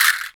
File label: WATER, Water Perc, H2O Castanet; Roland L-CD701